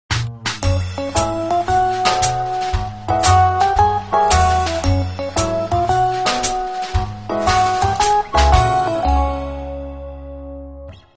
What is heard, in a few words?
Jazz it up; Samsung; SCH-U350 Smooth